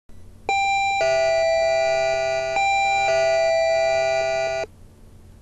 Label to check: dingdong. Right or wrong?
Right